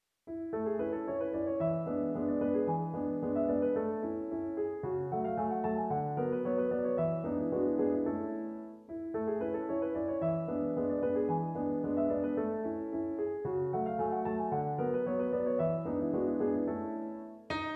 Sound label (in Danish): Gallopader for Pianoforte; af H. E. Lumbye